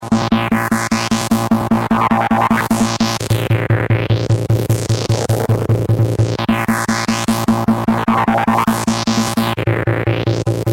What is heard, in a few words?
303 hell